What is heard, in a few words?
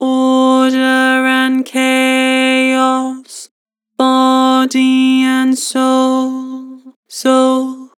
Vocals Flat Order and chaos; Harmor